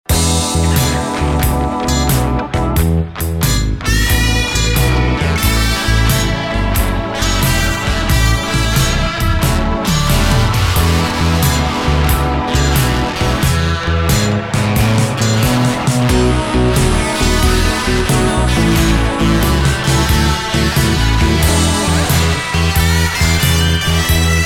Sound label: Blues